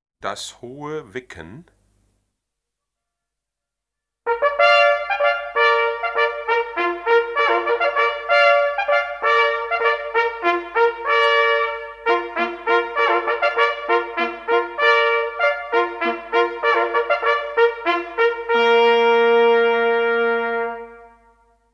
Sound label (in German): Das Hohe Wecken, jachthoorn